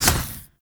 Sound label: Ghoul Drill Flame Burst; Warframe; Grineer Ghouls; Ghoul Drill Sergeant